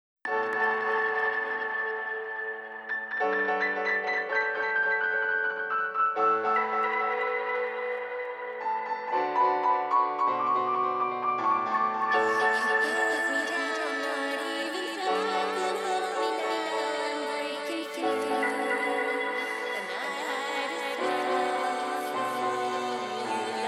Mix Delay Spread

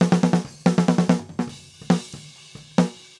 Distance Snare Cls

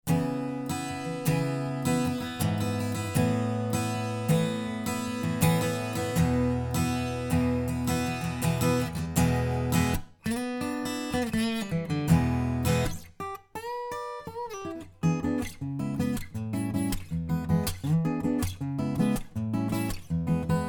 Ac Gtr

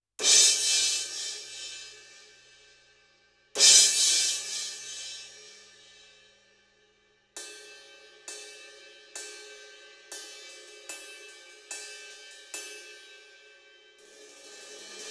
Cymb Crash; 90 Degrees From Vertical

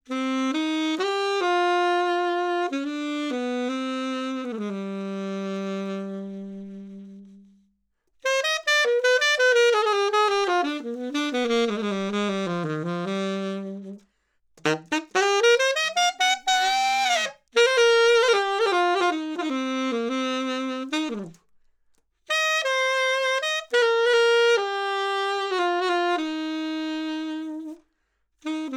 Sax Alto 20cm High Mid

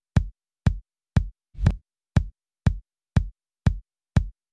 Kick With Sub

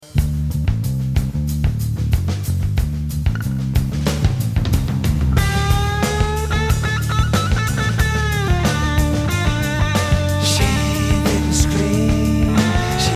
250Hz HPF Out

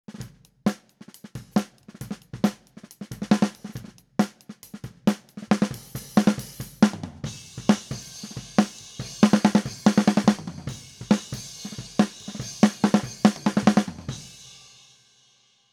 Snare Shoot Snr2 Top SM57

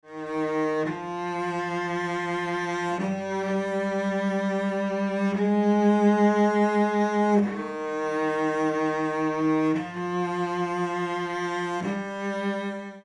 On Axis

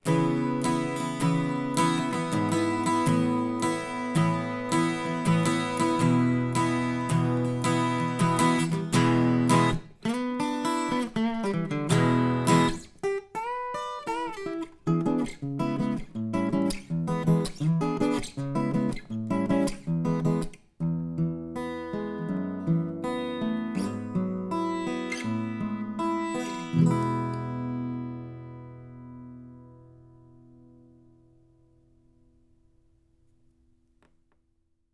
Ac Gtr Gtr Under Tail

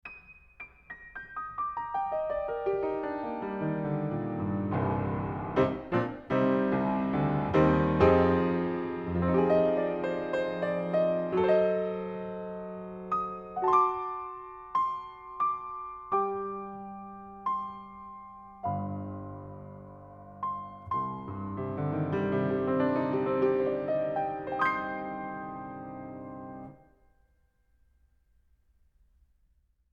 Piano, Close Outside Mic

